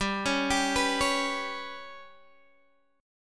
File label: G Major Flat 5th G, Guitar Open D; chordshape; down